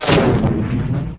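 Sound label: crash fiery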